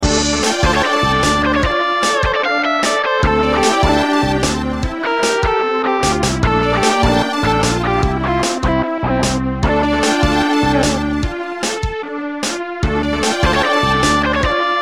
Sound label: Music Swamp; Megaman Flash